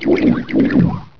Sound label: tube idle